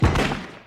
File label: gunshot; National Security Press Kit